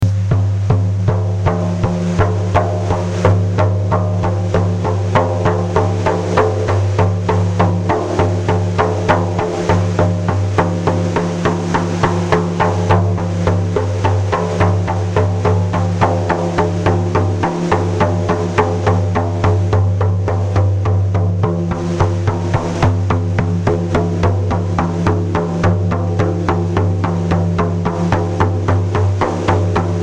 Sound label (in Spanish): tambor café 40cm; ome mazalt